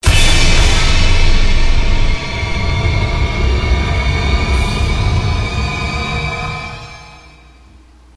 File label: Surprise; Blair Witch Volume III The Elly Kedward Tale